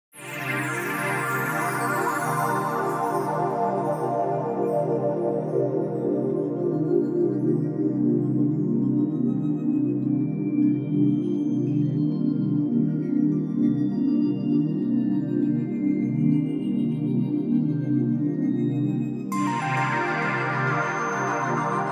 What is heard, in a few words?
vocal soundscape mix